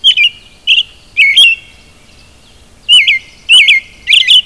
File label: robin